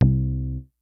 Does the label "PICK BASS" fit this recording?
Yes